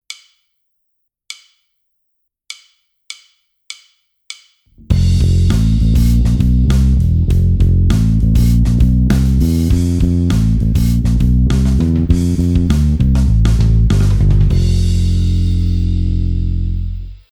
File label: bass phrase